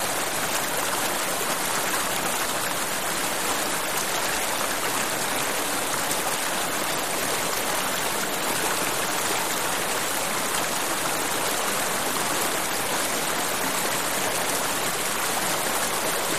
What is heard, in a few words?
River Flow With Light Rapids Over Rocks; rivers